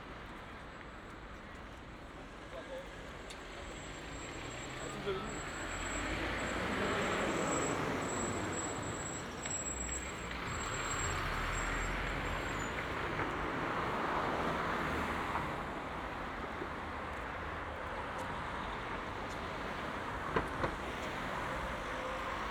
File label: busy street; IEEE AASP; scenes